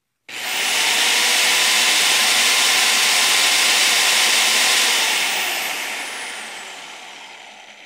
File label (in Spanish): Taladro Atornillador